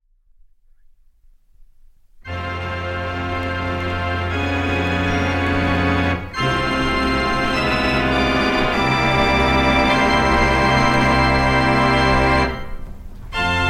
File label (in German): Engelein, orgelklange; Grund's